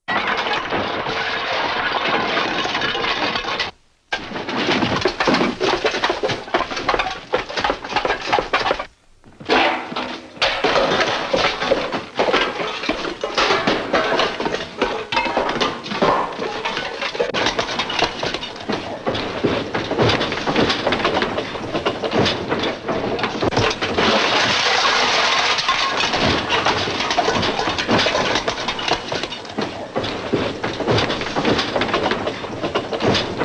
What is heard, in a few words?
Misc Crashes For Earthquake Or General Destruction